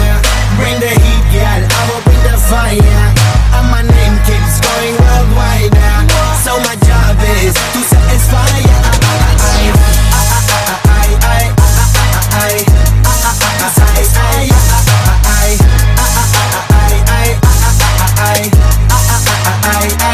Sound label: romantic english song ringtone